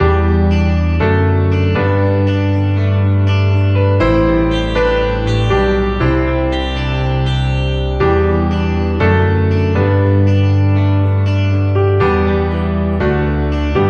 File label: Forever, No Drums